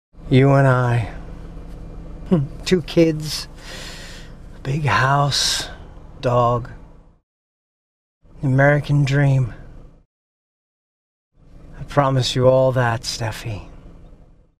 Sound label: car